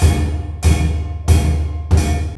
MALAY R, Heart of Asia; Spectrasonics - Heart of Asia CD2

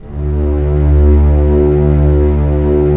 STR, Roland LA Composer; Roland - LA Composer vol. 4; Full Mutes

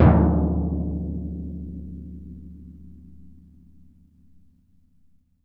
TMP, Synclavier Percussion

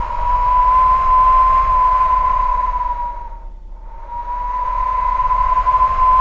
WIND HOWL, VOCAL WIND; ILIO; Vocal Planet; Jazz & FX

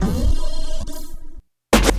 Scratch Hit Loop; Electronic Point Blank

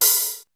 Open hat; ZERO G - Datafile DISC 2

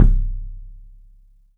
THUD KICK; 3D Drums

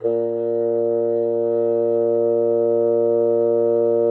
bassoon, A#2; Xsample VOL-6

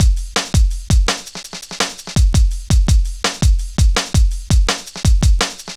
Drum Loops, Jungle, 166.5

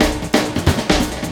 LOOP SD, 180 BPM; Ueberschall; Drum & Bass Flash Trackz

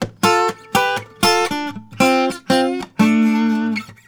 Blues Guitar Samples; ILIO; Fretworks; LICK

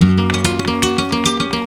GUIT, Gipsy

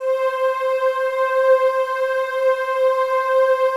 DIGI PAD; Best Service; XXL 800 Pads; Sample CDs

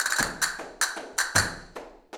Castanets, Flamenco; Best Service ProSamples vol.46; Percussion

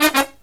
Brass Riffs

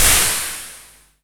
WHITE METAL; Q Up Arts; Dream Experience

